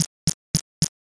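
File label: footsteps grass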